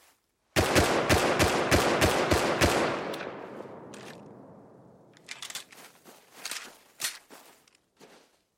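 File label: shooting semi rifle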